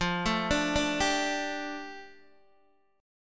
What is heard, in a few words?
F 6th Add 9th F down Guitar DADGAD, chord